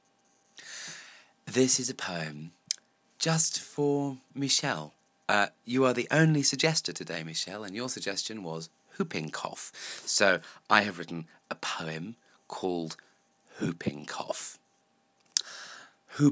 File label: whooping cough